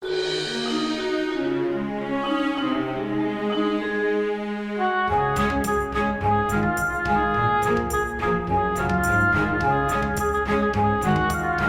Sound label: Orchestral Remix